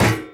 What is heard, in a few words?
physics turret gibs; Portal 2